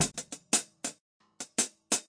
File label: hat mic, Drums